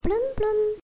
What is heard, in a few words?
open